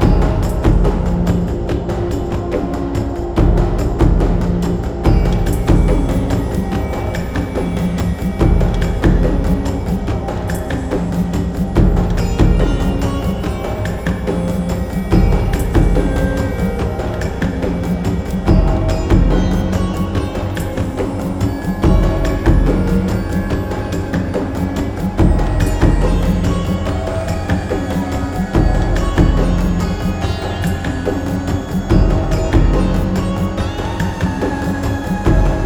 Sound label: Desert Battle; Earthlock